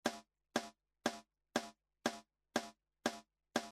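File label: Snare compressor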